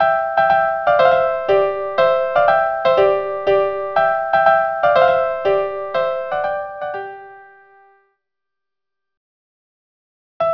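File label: violin violin Tambourin and Menuet; Corrette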